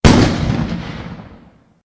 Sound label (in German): Handgranat Explosion; granny chapter two; android